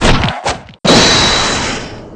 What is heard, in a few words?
salute double; roly